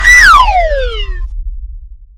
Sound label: zoom out